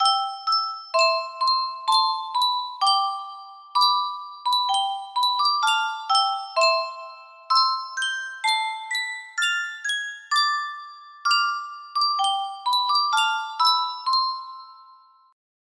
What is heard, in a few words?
music box melody